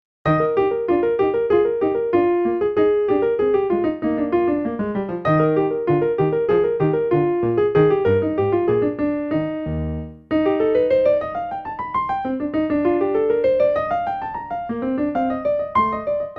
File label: toccata flat major; krebs johann ludwig